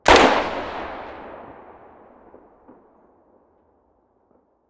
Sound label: muzzle mono distant, weapons